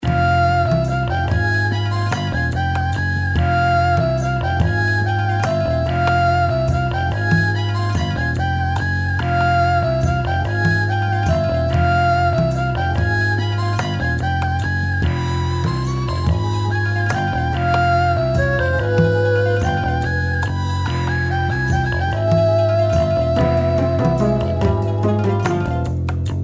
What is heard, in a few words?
folk; maoudamashii